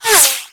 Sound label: Bullet Whizzby